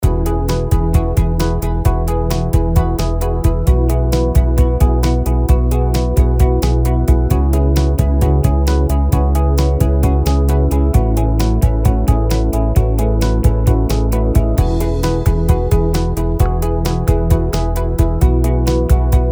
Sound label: Victory Light